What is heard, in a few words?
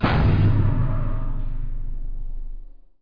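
punch hit; Machines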